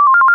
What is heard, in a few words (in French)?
alarme